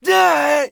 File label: scav death, voice; Escape from Tarkov; scav2